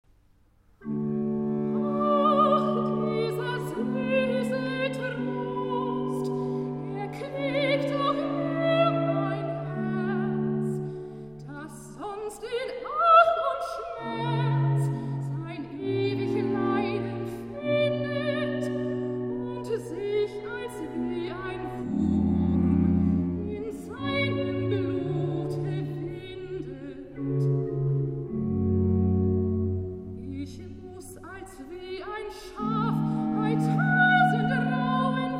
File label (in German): Bach Rezitativ